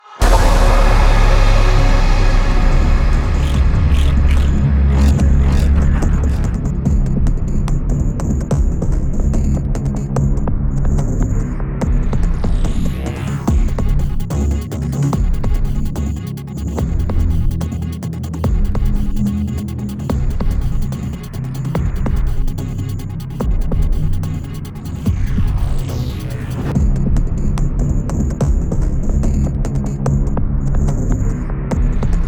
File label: Win Match; Korps; Modern Combat Versus